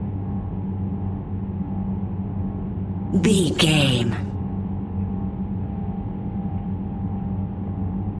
background hum engine motor ambience

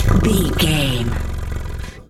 cat purr

